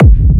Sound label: Techno Kick; 30 Techno Kicks 2025; Ghosthack